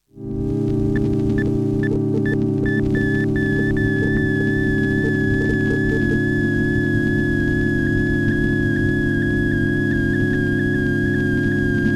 Casio CT660; circuitbending

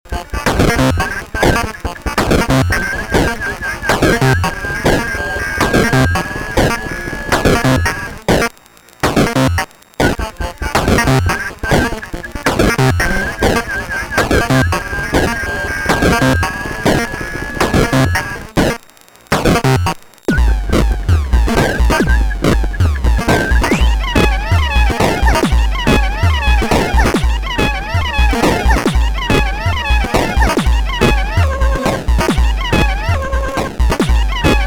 PO33 thru HSS2020

